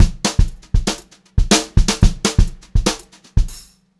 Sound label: Brushed Drum Loops; USB Soundscan vol.39; AKAI; 90 s Sample CDs; 120 HOTROD